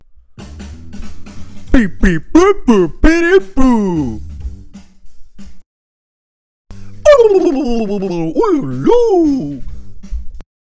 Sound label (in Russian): Рок-н-ролл вокал импровизация